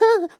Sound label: effort; Scrat